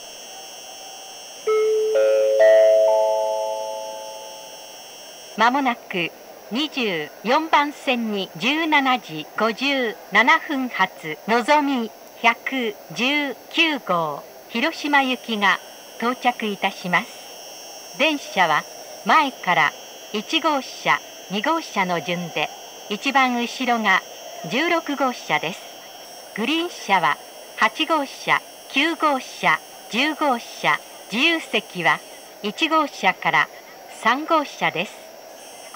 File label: shinagawa nozomi hiroshima, jr-central; station